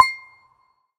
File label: gambler mouse click; Hot Party Deluxe; Wazdan